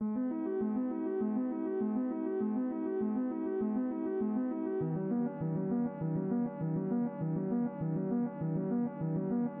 80s synth

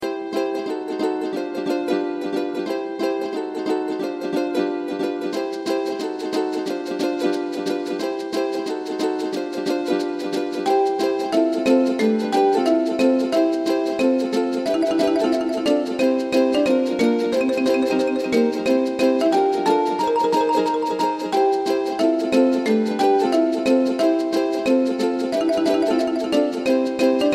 ukulele

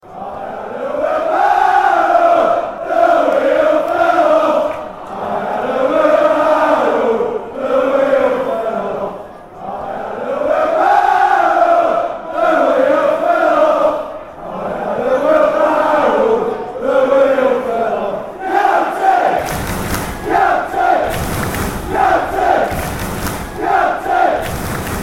Wheelbarrow Song

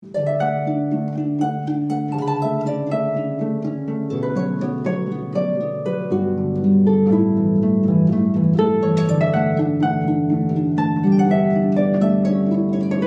Harp; Lotr

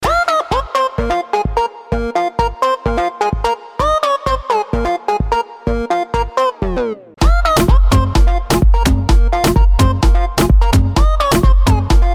Electro

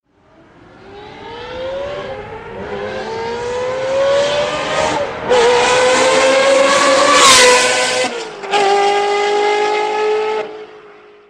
Ferrari 360

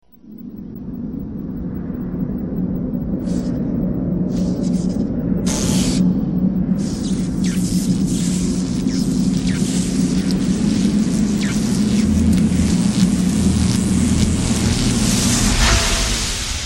Wind And Electric

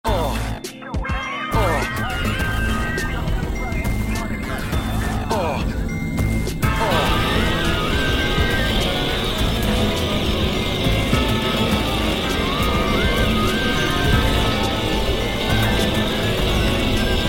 URBAN BASS

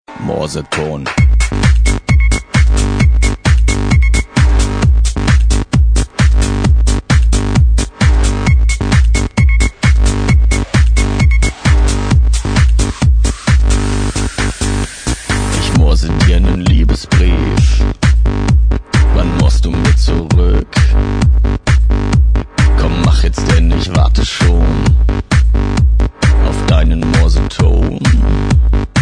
Morse tone

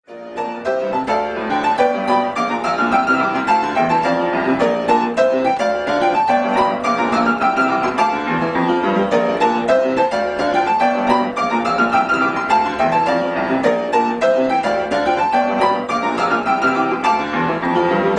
Death Waltz

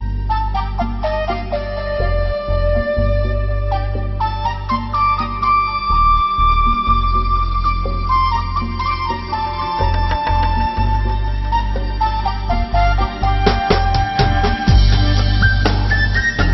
Gregorian Flute

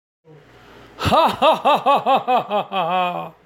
evil laugh

